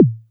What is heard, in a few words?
Sine Perc